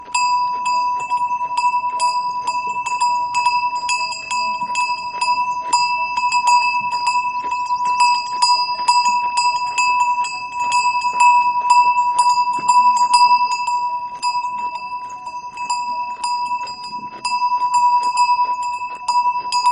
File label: yak